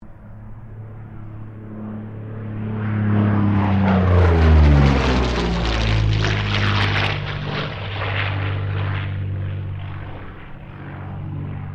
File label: AIRCRAFT P-40 KITTYHAWK PASS STEREO; P-40 Kittyhawk / Warhawk / Tomahawk; Airplanes; Vehicles